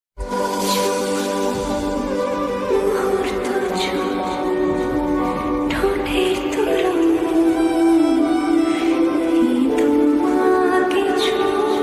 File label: bengali ringtone